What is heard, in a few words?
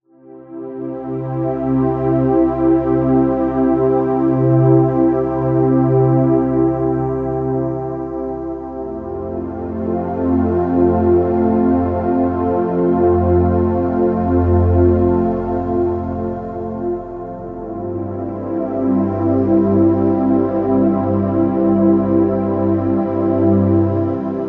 Meditation Music